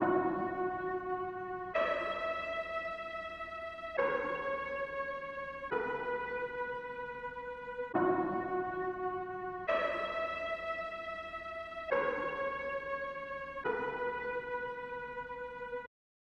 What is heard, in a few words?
121 BPM C# Min Accent, Pop; Melodies; Loop Stems; Arena